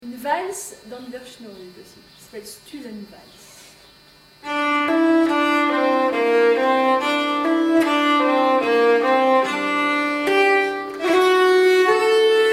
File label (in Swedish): stulenvals, nyckelharpa